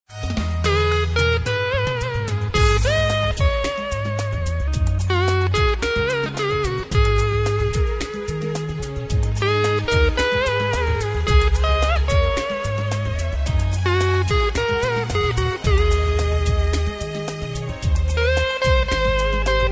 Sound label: Hindi Instrumental